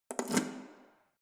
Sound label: letterbox metal insert key hard